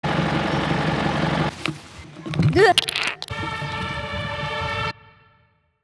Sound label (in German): Space Sound